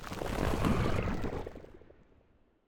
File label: Void Appear